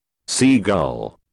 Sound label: sea gull